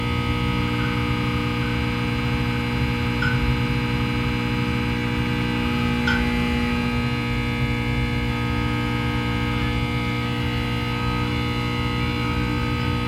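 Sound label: light hum loop; ambient lights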